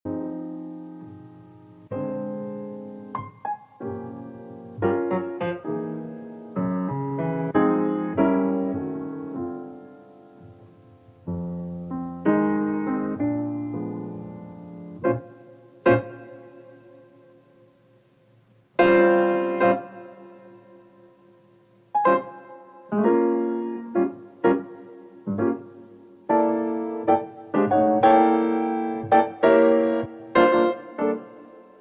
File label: Jazz Piano